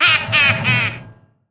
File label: Lane Switch Middle; Trickster; AudioWizards